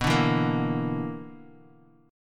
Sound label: B-Minor Major 7th Double Flat 5th, Bass Standard; BmM7bb5 strummed; JGuitar